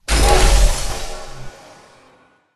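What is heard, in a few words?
Melting Furnace Burning Heat; Dark Army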